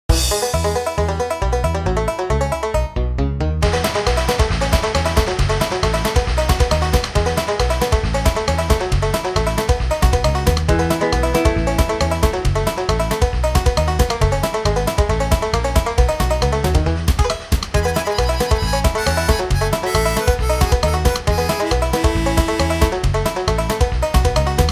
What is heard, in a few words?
Multiball, Pinball, Music; Pure Pinball